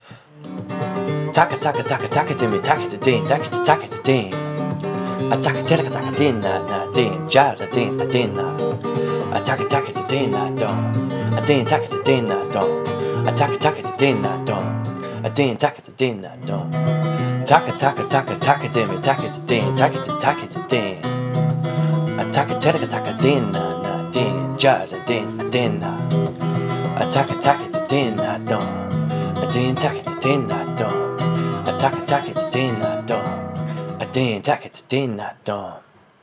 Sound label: Konnakol rumba; flamen konnakol